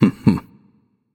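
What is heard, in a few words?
nonverbal heh heh; voicepack; smite; merlin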